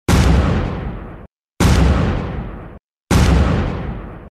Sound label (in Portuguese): explosoes, som de explosao alta; toques para celular